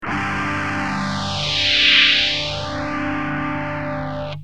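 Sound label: guitar tc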